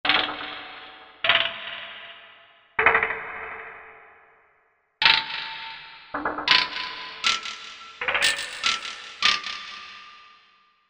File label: Nutcracker, Sound Effects; Korg MSC-14S Sound Effects